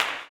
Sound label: CLAP, Blues Soul; Spectrasonics Vocal Planet